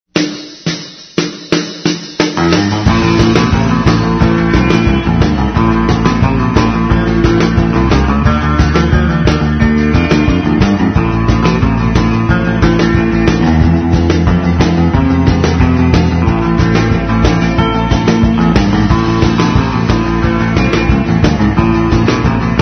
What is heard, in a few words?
Gtr Wave Breaker; A Kay Value Leader branded Old Kaftsman